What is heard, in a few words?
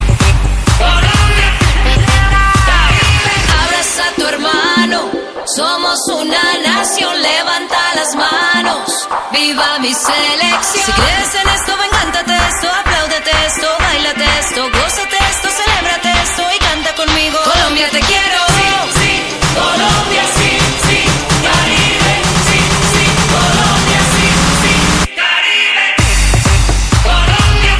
colombian anthem